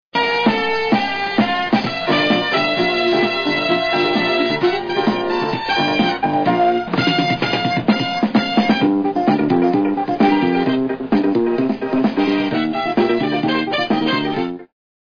ritmada

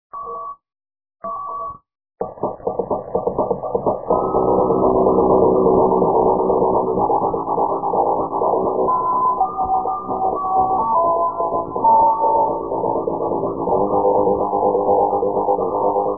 bateria

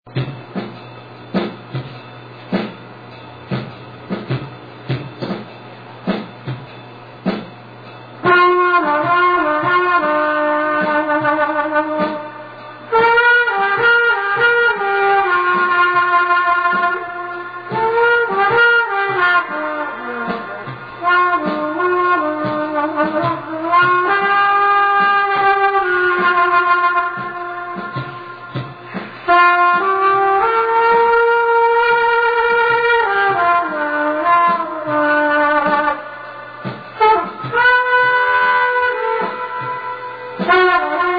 sopro combatida

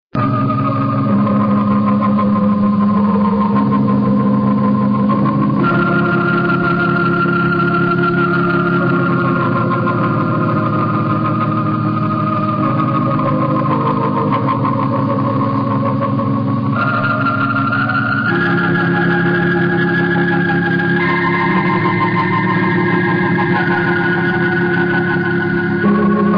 orgao

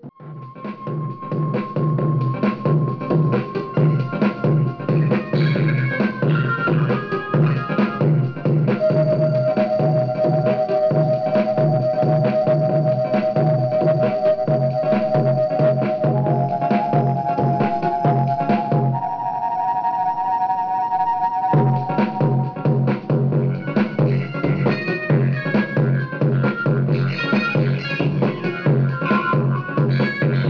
bateria e piano

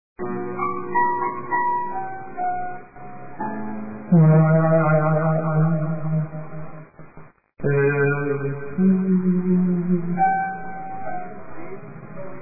piano cantada

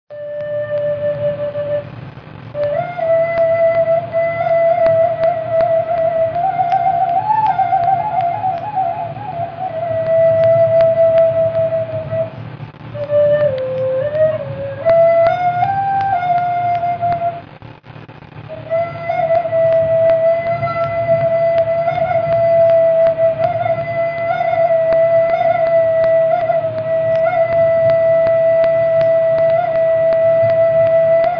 sopro

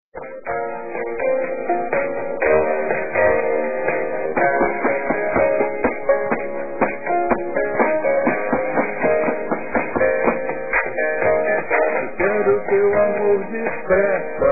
cantada

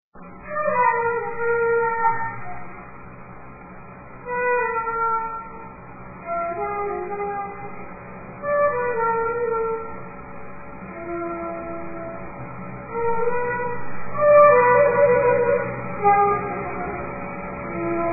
trompete

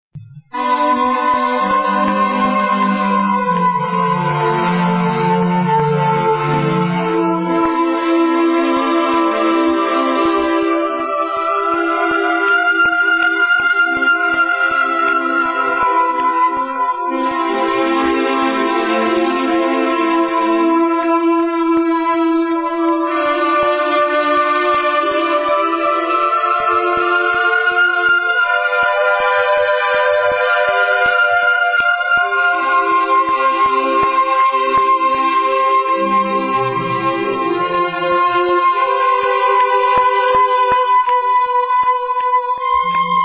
som teclados

